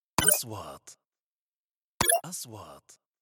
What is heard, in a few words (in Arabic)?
نغمة نقره الكترونية, transitions